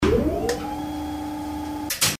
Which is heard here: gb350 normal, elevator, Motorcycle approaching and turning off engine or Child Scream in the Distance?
elevator